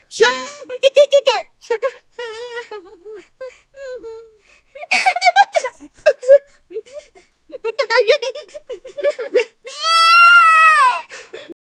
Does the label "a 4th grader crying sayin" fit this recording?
Yes